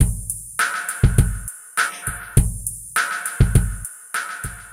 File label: Emery Beat, Electronic Beats; Electronica